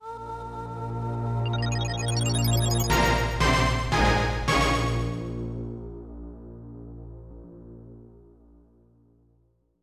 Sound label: Fanfare; Mujintou Monogatari; SNES